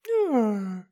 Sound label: yawn; little woodland fox soundboard